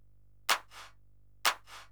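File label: percussion, house, 125, drum; eJay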